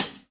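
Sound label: DSM1 closed hi hat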